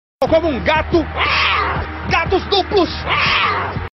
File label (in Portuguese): como um gato miauuu